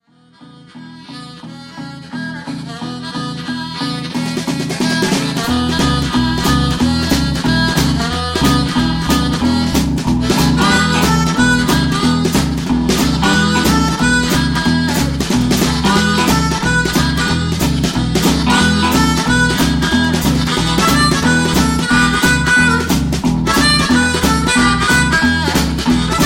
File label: Evans Shuffle